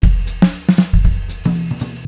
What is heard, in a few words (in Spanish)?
Loops mutantes